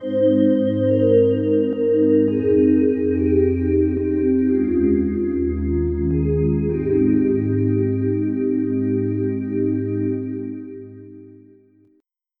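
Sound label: bowed glass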